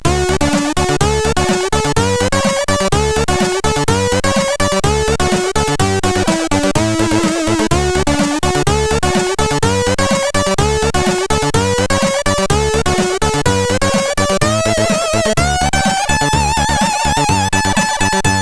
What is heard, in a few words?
Bompi Fraggle sid CSG8580R5; hvsc